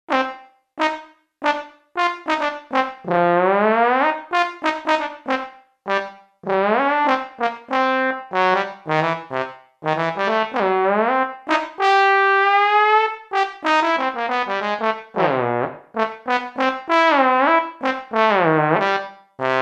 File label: Trombone, Roland JV-880; Factory Patches